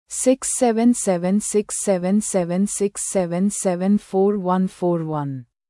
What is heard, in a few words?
song